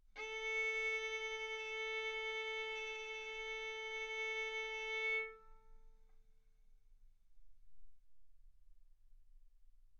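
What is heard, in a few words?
Violin arco mf A string A4 B4 stereo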